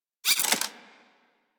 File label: Pistol Mech A; Sybaris